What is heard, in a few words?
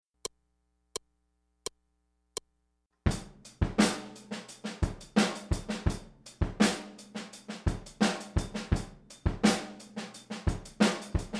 Fusion Rhythms